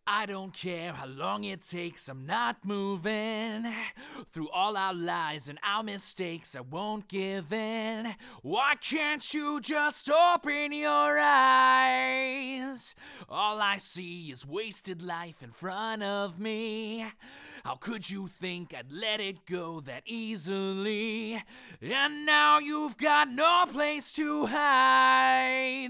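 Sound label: Male Solo, Neumann U67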